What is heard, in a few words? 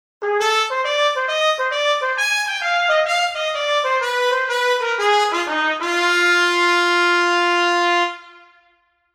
Solo Trumpet